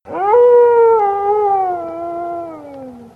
loup

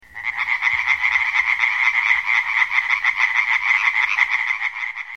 rainette

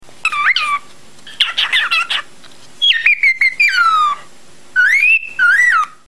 calopsitte

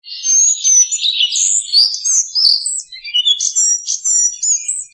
mouche

